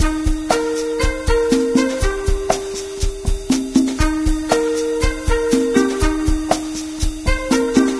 Cafe Music; Diner Dash 2 Restaurant Rescue

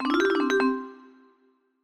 Jingles perfect

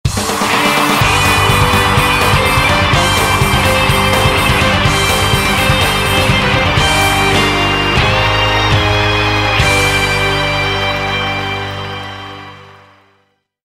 Victory; MLB Power Pros; Postseason Victory

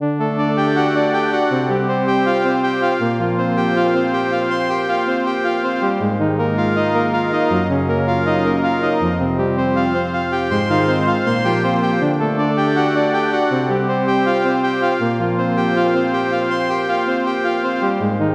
Bgm Jingle; Etrian Odyssey 2 Untold The Fafnir Knight

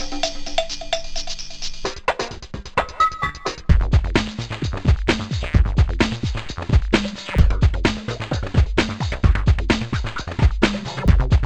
BGM Cutscene Energetic; Monsters vs Aliens; NDS

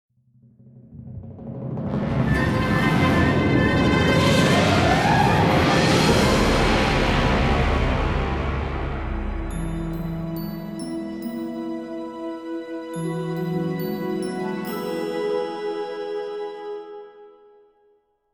Jingle Rock Crash; jack keane windows 2007

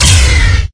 Vehicles

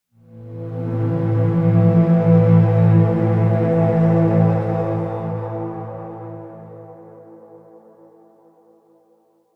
Tone Organ; Tone Organ Explore Match Intro 5

